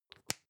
lipstick close soft; haus-garten; badezimmer

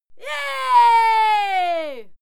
female cheer yay; schreie; menschen

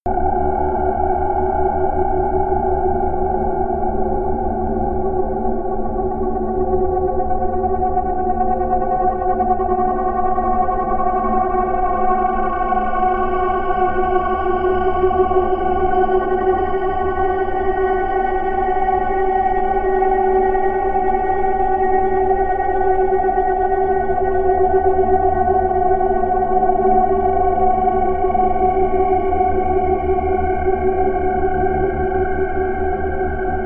drone scorch high

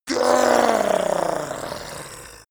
yeti grunt pain anger

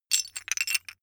metal pieces clatter short

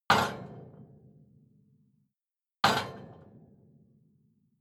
metal impact iron ring rattle

